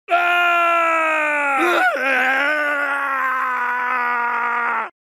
male scream

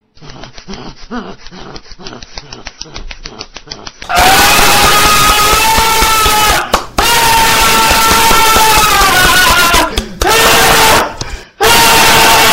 orgasm

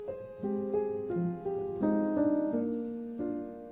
sad piano

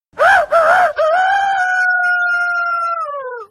imitates rooster crow; peter pan

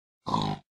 pig sound; minecraft

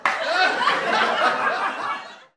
sitcom crowd laughing

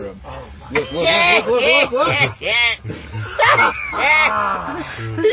laughing

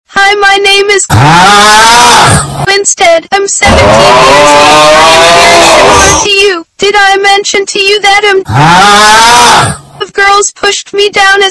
ughhhhhhhhhhhhh

